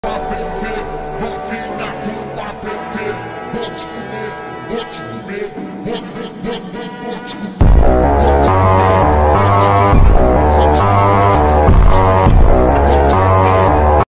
phonk; tom and jerry; troll face meme

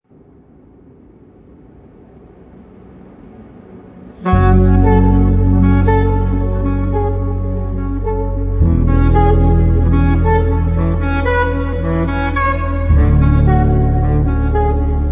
echo sax

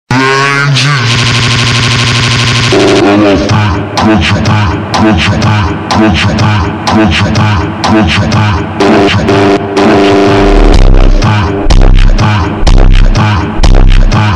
ultra slowed

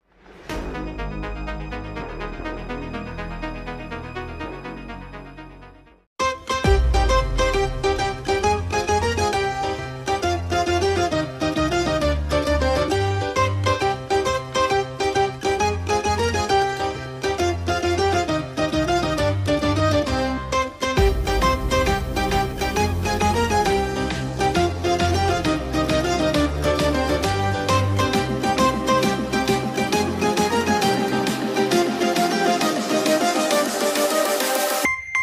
techno electronic; Italien Taranella Remix; mixtape

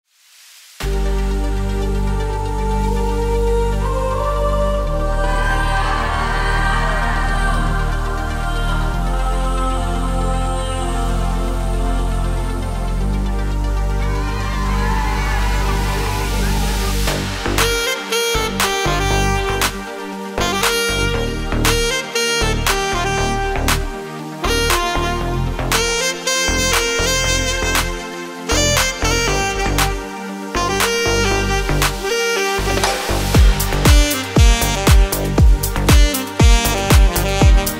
Saxophone House Music; Quiberon; Bretagne Morbihan

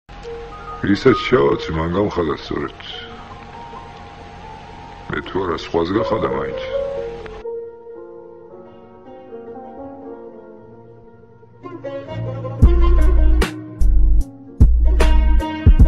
slowed remix

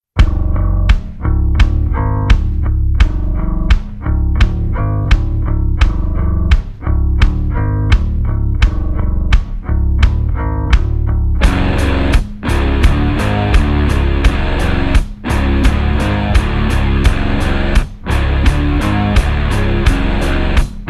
super slowed reverb

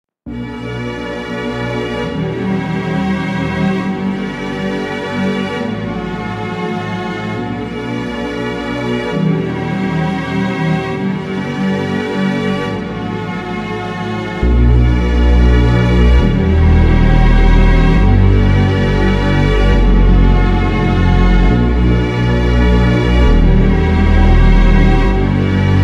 instrumental slowed song for sad

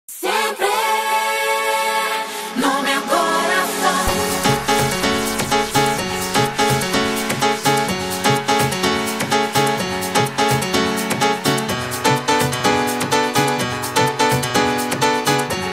afro house remix

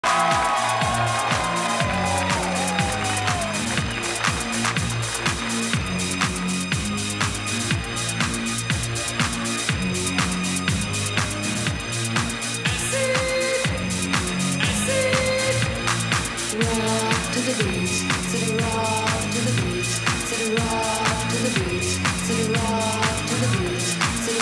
rock to the beat

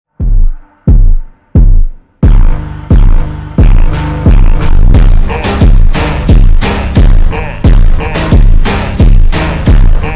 super slowed reverb brazilian phonk

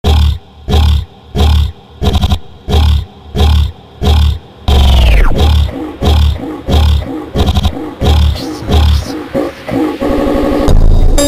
funk oscuro superslowed

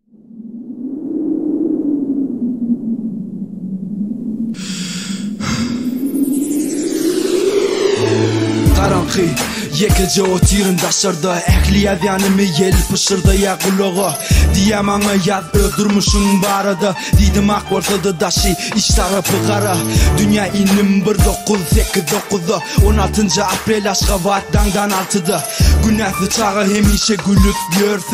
hiphop

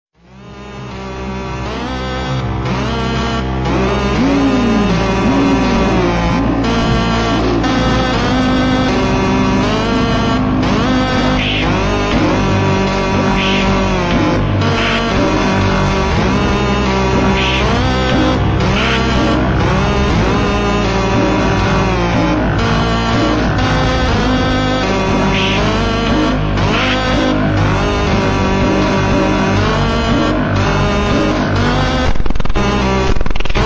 extremely slowed reverb

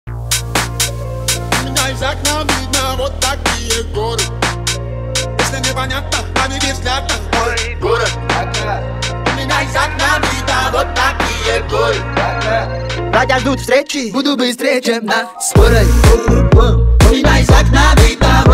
radio remix

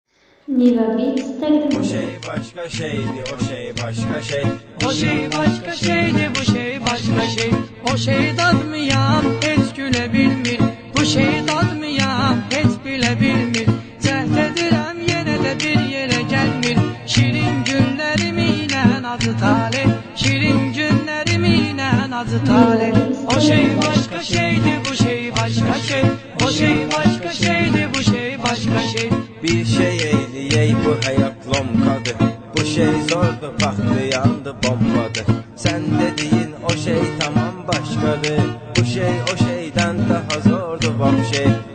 azeri bass music remix

